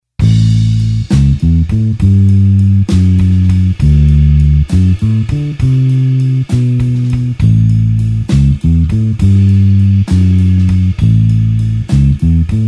blues bassline slow A

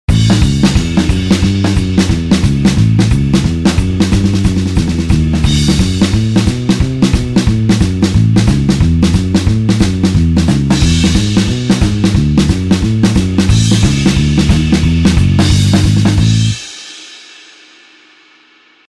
blues 2 beat

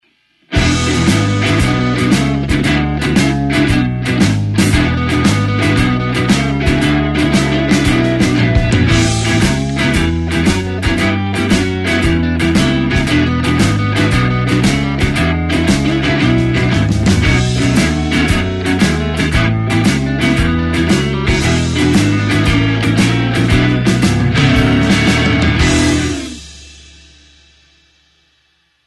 E shuffle

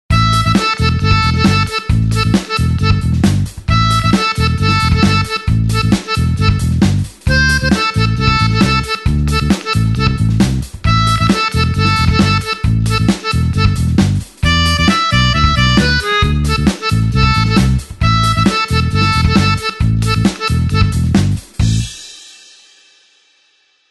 blues harp 8beat A

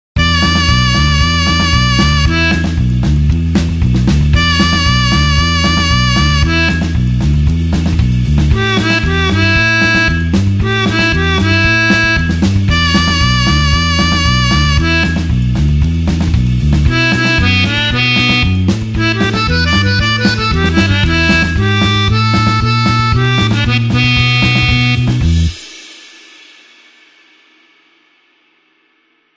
blues harp 8beat E

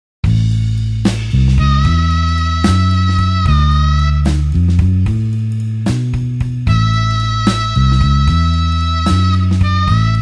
blues harp slow A tempo

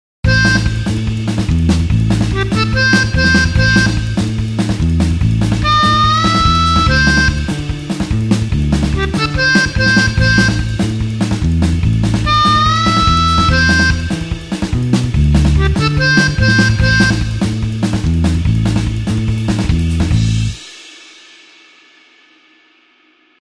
blues harp 8beat A

